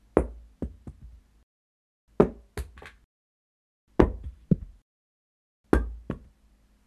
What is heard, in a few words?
Lightweight Items Of Different Materials Being Dropped Onto A Carpeted Floor